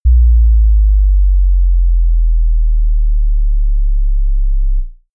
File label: bass drop soft